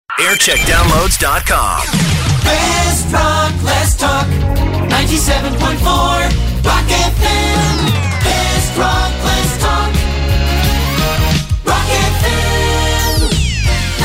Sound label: Jingle Montage; Rock FM; JAM